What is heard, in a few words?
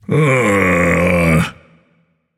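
non-verbal seriously; smite; atlas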